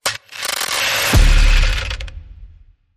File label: Film Projector Tape Hit